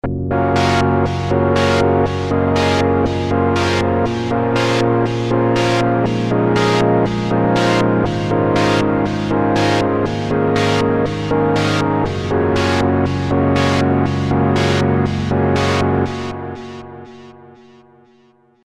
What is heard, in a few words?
OVERDRIVEN 7THS, synthesizers; Redshift 6